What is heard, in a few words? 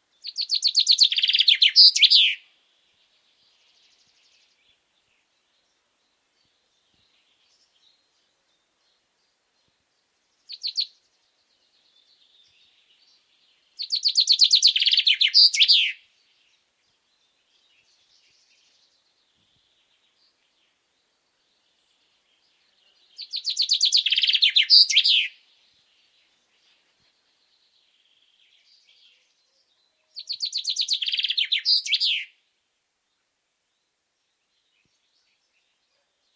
Fringilla coelebs; SETE